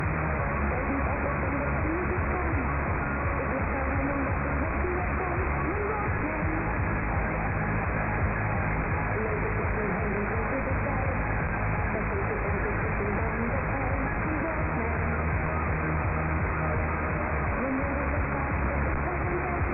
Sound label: nordhorn sdr 1098khz 10 watt pep